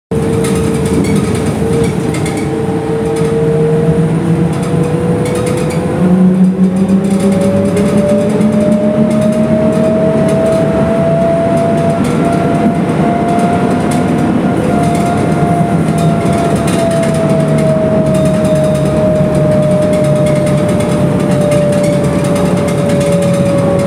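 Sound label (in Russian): звук автобуса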